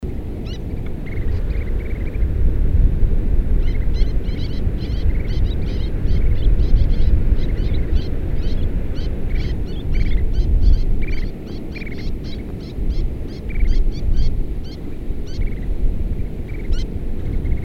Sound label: Little Tern Call, Fujian Changle; sterne naine sterna albifrons ssp sinensis